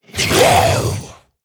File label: Zombie Monster Attack; Pain Train